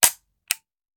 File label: Machine Gun Foley; Sound Ideas; Guns